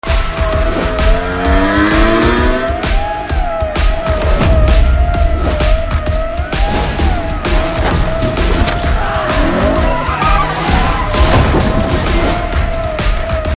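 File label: Trailer background music Ringtone; Kadaram Kondan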